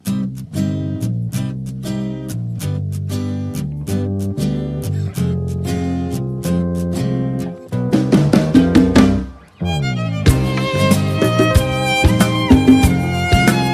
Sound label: Guitar Instrumental Ringtone; ringtones